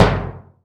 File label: SURDO, PERCUSSION; GM PERCUSSION KIT; AKAI S6000 CD-ROM - Volume 5; GM PERCUSSION KIT 2